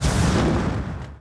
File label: magic fireball hit